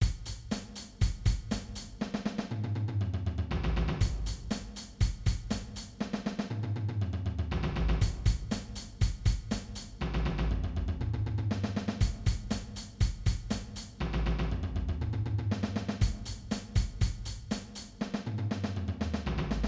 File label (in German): Sechzehntelnoten Schlagzeug